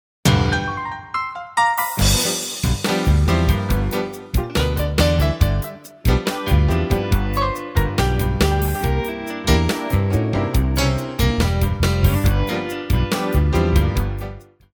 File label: blues